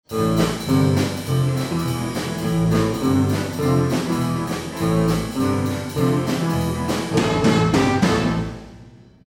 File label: Jingle; Schulradio-Sender, die Simssee Welle